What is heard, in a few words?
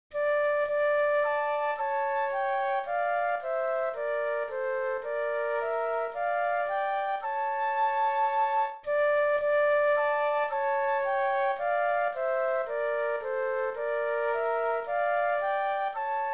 Flute Duet; christmas